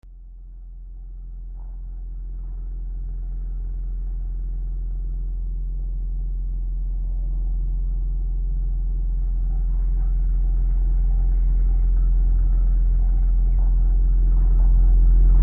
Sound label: sewing machine